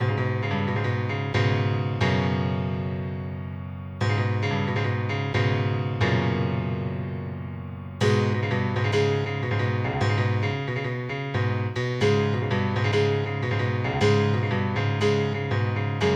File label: Prelude for 19ET Piano; Jeff Harrington; Prelude 4